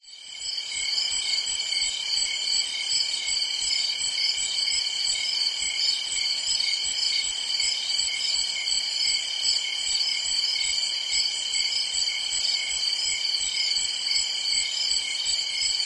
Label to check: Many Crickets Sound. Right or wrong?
Right